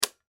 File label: Switch On Device, Switch On Device Light Wall; Switches; Foley